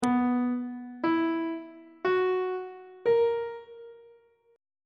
c flat major 7th suspended 4th chord intervals on treble clef